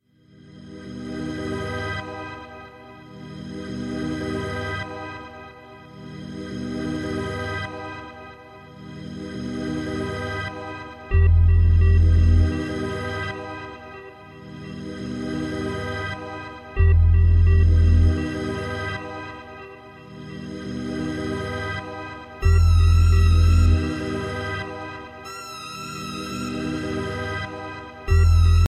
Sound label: rock the funky beats ringtone